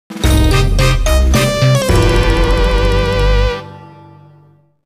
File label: Lose; Taiko no Tatsujin Doka to Oomori Nanadaime; PS2